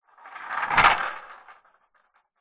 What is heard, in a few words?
leisure toy truck plastic push roll pass by carpet floor